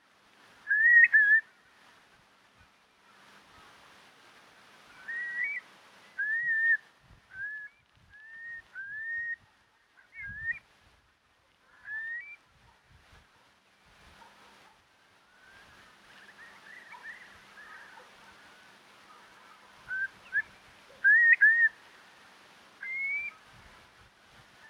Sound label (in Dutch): Wulp Numenius arquata arquata